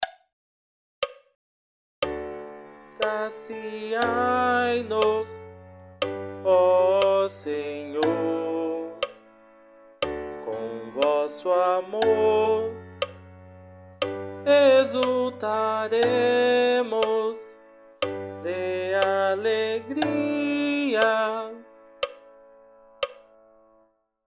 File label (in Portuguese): Salmo coro